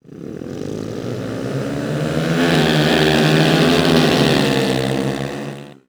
Start, UI

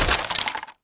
skeleton body; Pirates Of The Caribbean

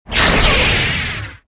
beam start; cairn

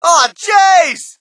crowd, shout; Mike Tyson Heavyweight Boxing

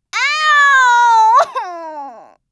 HURT; True Crime Streets Of LA; XBOX

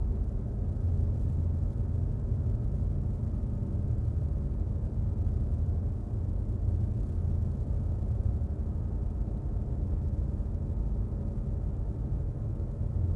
landing Ship; Star Wars Obi-Wan; XBOX

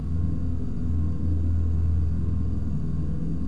amb power Tube; Star Wars Obi-Wan; XBOX

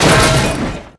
hit metal can; hits; Tony Hawk's Project 8; XBOX

